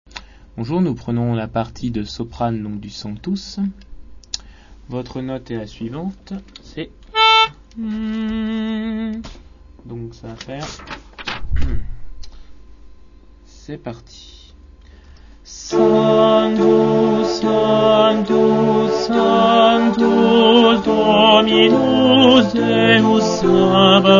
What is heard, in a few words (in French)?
Messe Monteverdi Sanctus sopranes